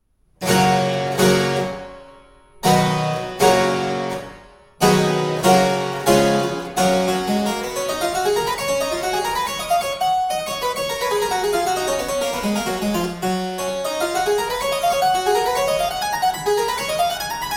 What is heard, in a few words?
in G Major Prelude, English Harpsichord; Lesson 1; James Nares